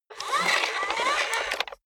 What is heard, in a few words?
robot movement slow